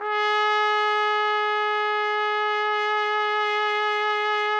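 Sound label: TPT, trumpet; Roland - LA Composer vol. 3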